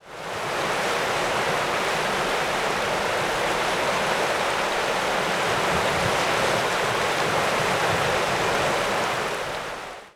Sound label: Athabaska River